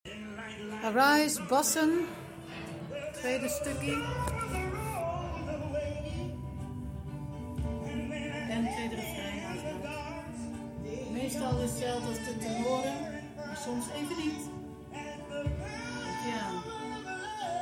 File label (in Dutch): Bas Tussenstuk En Tweede Refrein